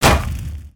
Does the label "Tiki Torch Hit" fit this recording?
Yes